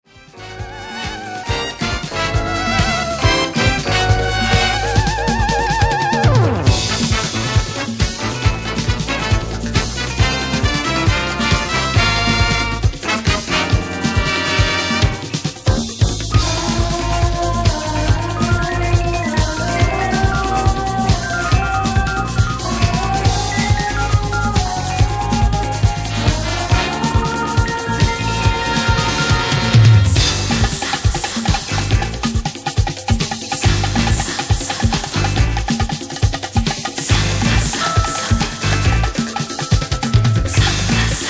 Samba Funk

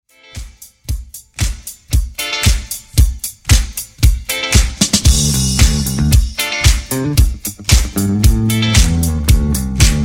Instrumental

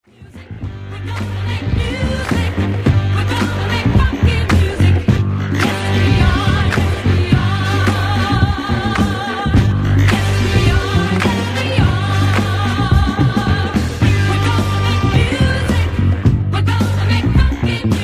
Funky Music

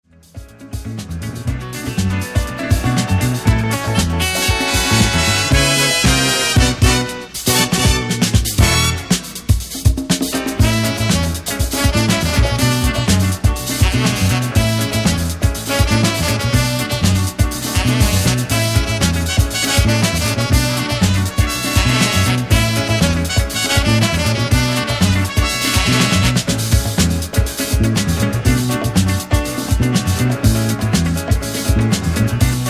Samba

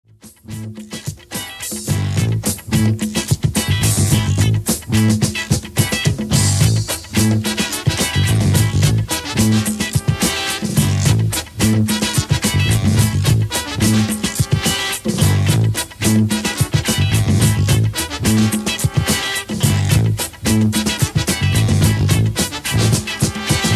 Tropical; funky soul

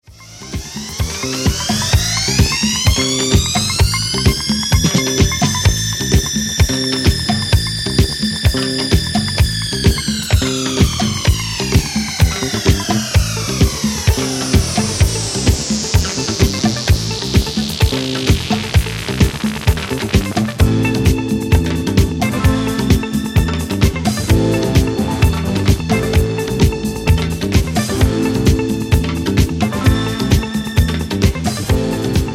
Slick Space Bass

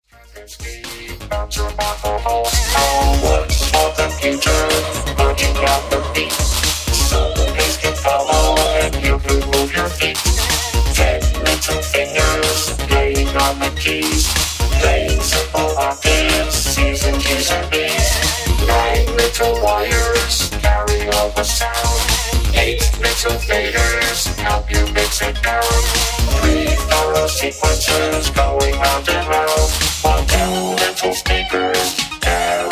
Six Simple Synthesizers

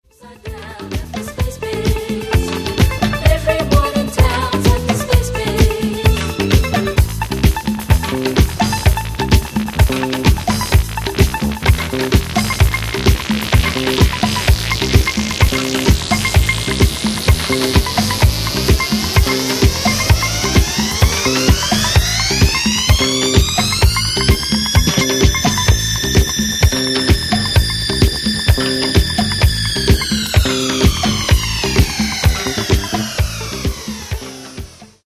Slick Space Bass

